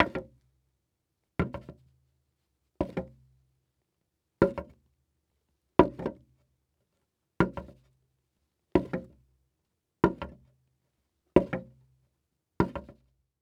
Foley Footstep Golf Cleats Slow Walking On Wood Board Walk; Foley Footsteps